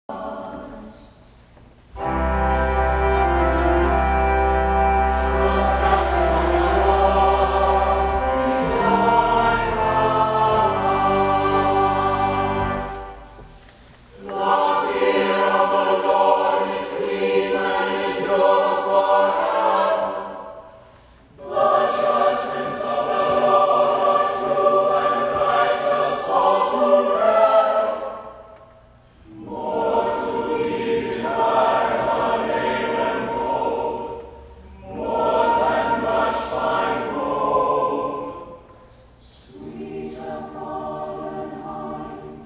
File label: Psalm 19 Chant with refrain; Psalm 19:1-9; Hallock